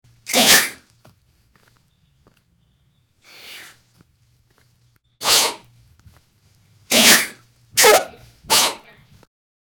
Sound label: snack snatch sound